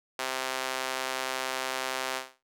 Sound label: SAW HP CutOff 405Hz; Alchemy